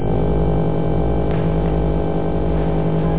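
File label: TARDIS scan; drwho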